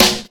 raw snare drum f sharp key, Loudest frequency: 3646Hz